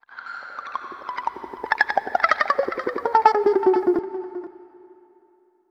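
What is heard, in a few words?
GIT-FX, guitar, Disco; Best Service ProSamples vol.48 - Disco Fever; AKAI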